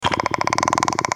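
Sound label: wait, basilisk; basalisk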